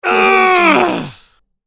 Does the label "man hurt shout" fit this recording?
Yes